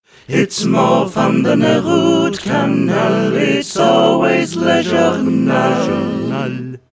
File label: jingle